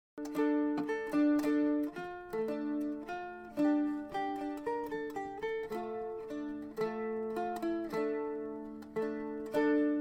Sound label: Lute